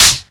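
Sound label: Hihat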